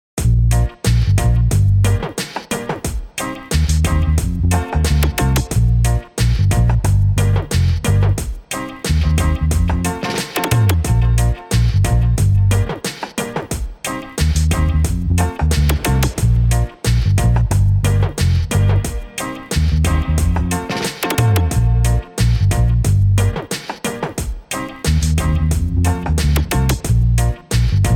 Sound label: Reggae Tape Vinyl